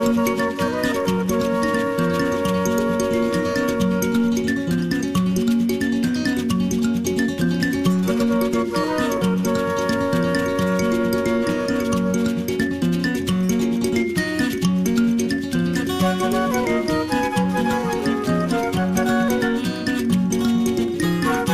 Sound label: Traditional (Luganda); Munomuno (from South Africa)